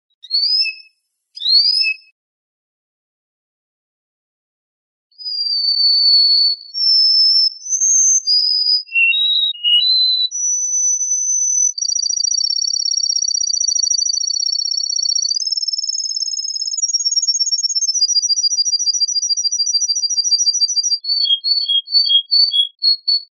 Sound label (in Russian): russkiy kenar